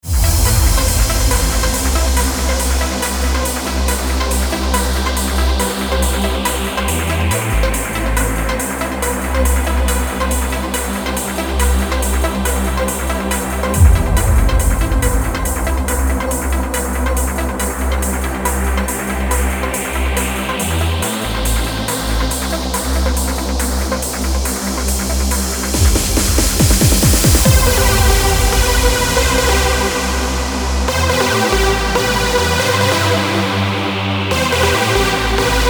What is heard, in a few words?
Hard Trance Melody Breakdown